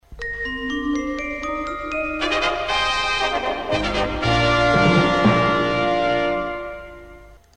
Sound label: Jingle; LWT